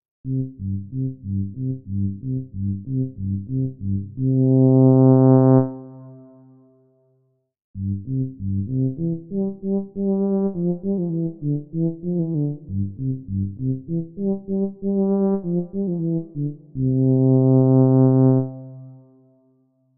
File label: tuba